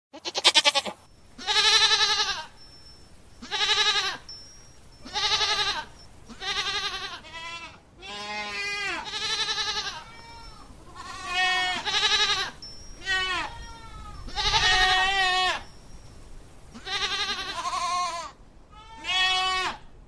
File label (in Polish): KOZA